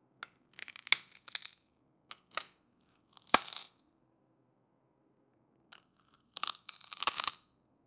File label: a knife cuts a pie